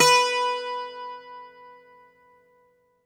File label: Greek Bazouki, Plucked Strings